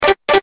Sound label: Notifier Dimples; Blackberry Bold